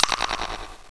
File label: pick